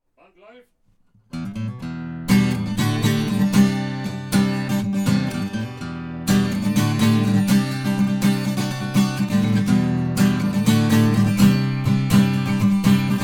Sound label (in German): Akustische Gitarre; Fredenstein F200